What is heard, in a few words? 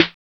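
snare drum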